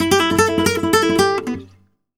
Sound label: Flamenco Guitar Picado, e; Best Service ProSamples vol.46 - Flamenco